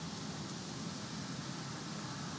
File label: Ambi Loop, outdoor samples Ambience Loops